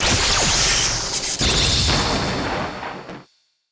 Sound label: Enemy Evolution Active Transform; Disney Star Smash; Android, iOS, Mobile